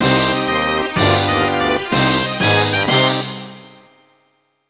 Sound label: Final Door Unlocked; Banjo-Kazooie HD; N64 gamerip 1998